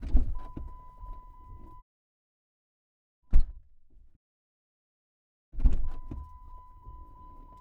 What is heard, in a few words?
Dodge, SRT8, Door, Various, Interior, ORTF; Challenger; 2011; MKH8040; Pole Position